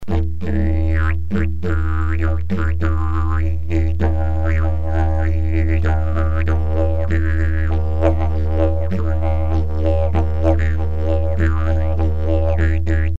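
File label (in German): Leder D#, Didgeridoo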